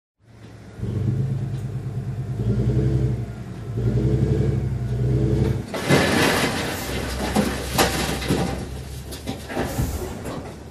Garbage Truck Dumps

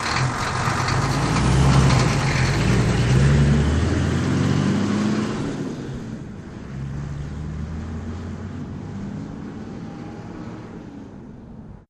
Truck Hino Diesel

